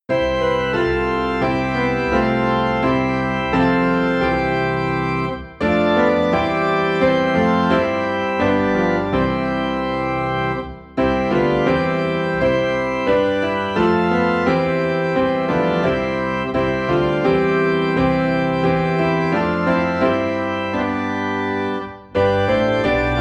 While Shepherds Watched Their Flocks, Piano and Organ in C; christmas